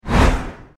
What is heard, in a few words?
Whoosh; Borderlands The Pre-Sequel